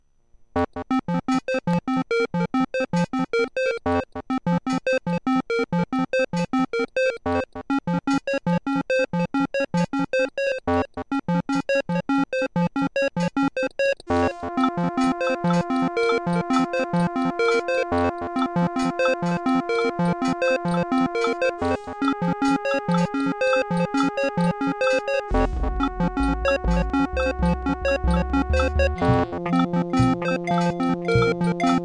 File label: nvidia nv1 (opl3); Descent